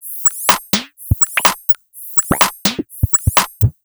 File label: 125bpm, House Drumloops